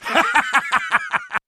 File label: laugh; cakehole; freak fortress 2